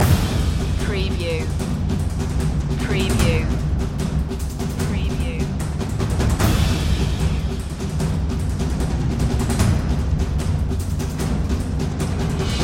forged in fire percussion loop

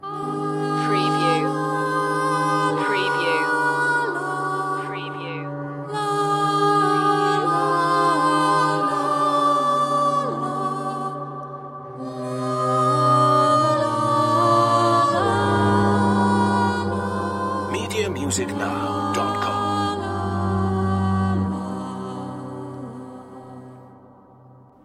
celtic requiem loop

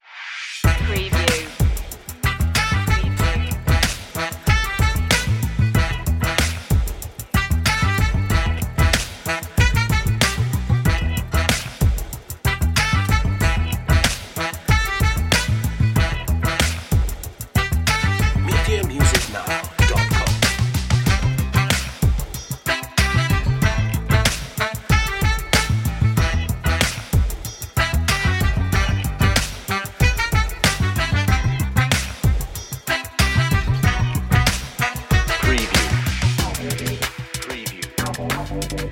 dope sax

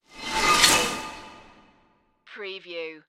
Whoosh Metal

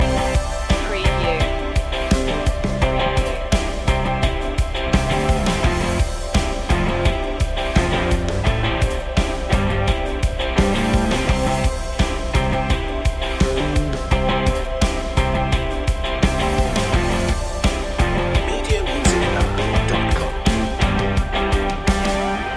pressured loop

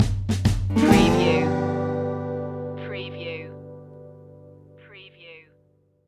belly up blues stinger

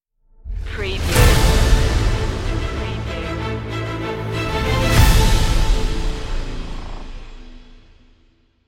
hit hard stinger

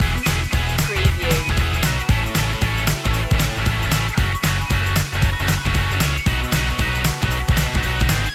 rock me indie loop

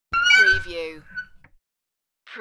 Creaky Door

